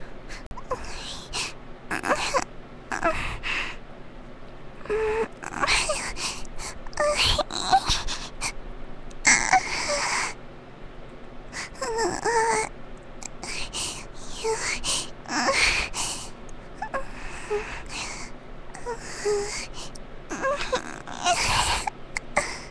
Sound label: Moaning; Vocaroo; Fluttershy